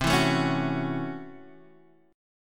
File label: A 6th C, A6/C chord; strummed